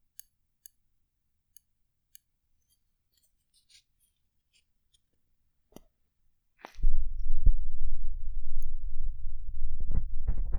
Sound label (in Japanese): 声なし 耳かき 音8種; YouTube毎日耳かき配信